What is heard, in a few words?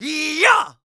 Yells, Viking Vocal